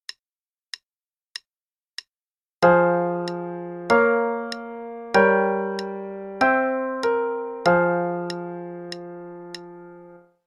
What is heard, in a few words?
Chord Progression - f minor (qn=96); CM Level 6